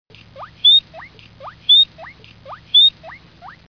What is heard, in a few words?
squeaks, abyssinian guinea pig